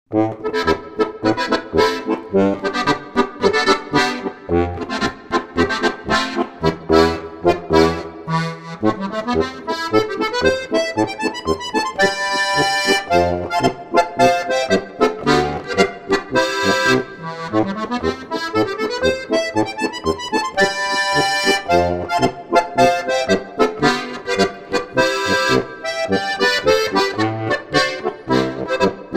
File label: Polka